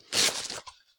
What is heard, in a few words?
mag pouch, weapons; Urban-Coalition; Decay; arccw ud